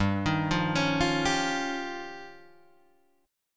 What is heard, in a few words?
F 7th Suspended 2nd Sharp 5th